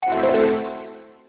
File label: FAILURE